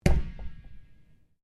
Ball Swiss Bounce Slap Fist, Large Swiss excesize ball; Equipment; Sports